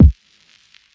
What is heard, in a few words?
kick; eddyflux; crate bd; dough-samples